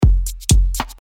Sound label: Beat